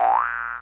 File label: trampoline; cstrike; blockmaker